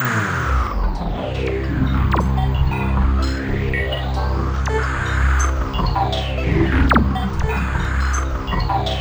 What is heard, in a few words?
TRANCE, SynthLine; 90 s Sample CDs; NiceBeats; SynthLine VOL-2